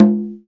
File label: Congas, Latin; Roland L-CD701; PRC Latin 1